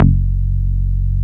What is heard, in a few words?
PRESSURE F#1, BASSES